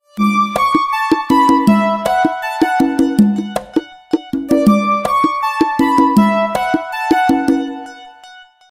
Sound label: dreams; tecno